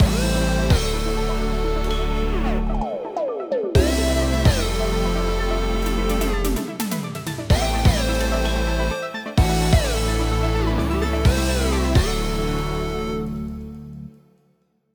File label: time travel theme stinger; teardown; ps5 windows xbox series xs